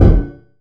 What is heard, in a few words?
epic metal soft